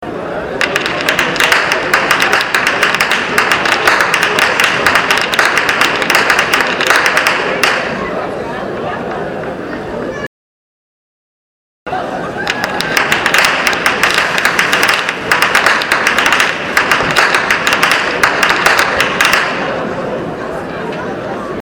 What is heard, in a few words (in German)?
schnalzen, jugend; roth